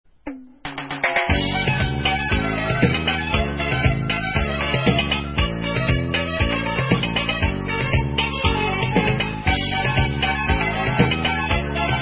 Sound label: Instrumental